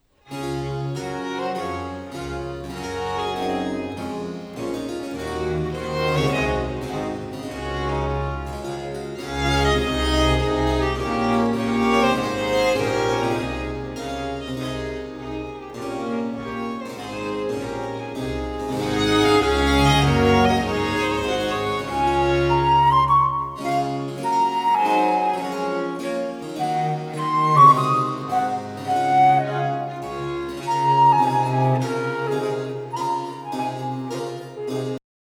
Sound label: Flute Concerto in D Major Affettuoso; TWV Anh. 51 D